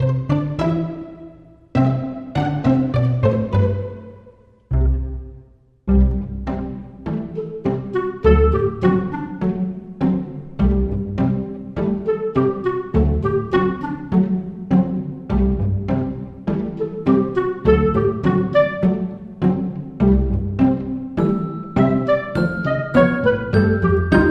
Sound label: No Perc